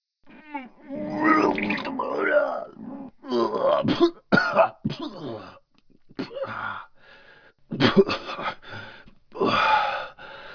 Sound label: puke; tfc